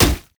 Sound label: q spell bounce; LoL; ziggs poolparty